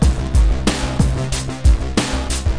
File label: big beat; PC Gamer IT CD 38; MTDemo